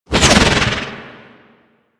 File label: Archgun Projectile By; Warframe; Archwing